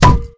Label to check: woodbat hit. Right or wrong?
Right